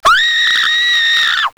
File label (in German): schrei